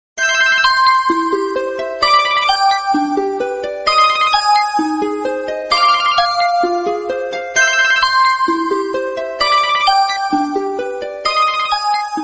electronic telephone

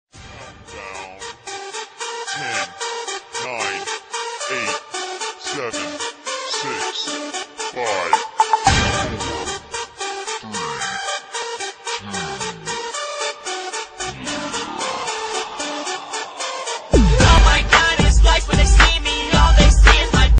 hiphop

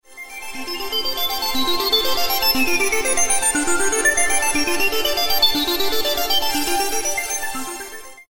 exotic iphone, ringtone

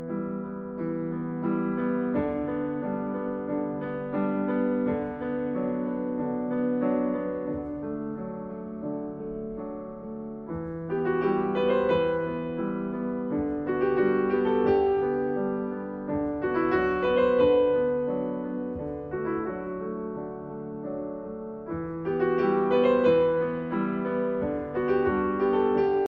amazing piano music

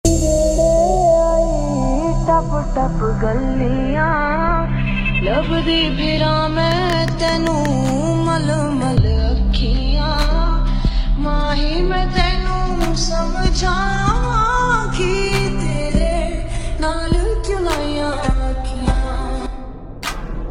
trap

